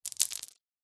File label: foley stones small handful set down on tiled floor